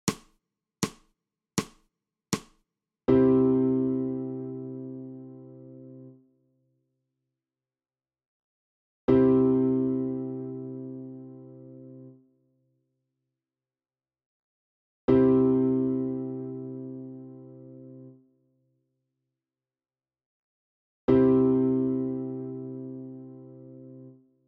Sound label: ALTO